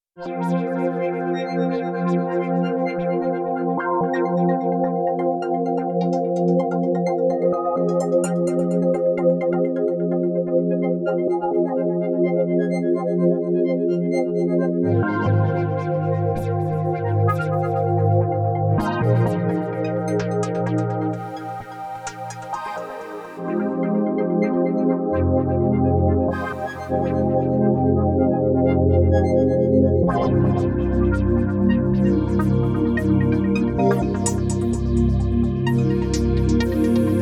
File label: Pad